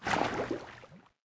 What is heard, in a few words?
water underwater; matmos interactions